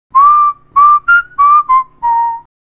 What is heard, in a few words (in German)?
Signalton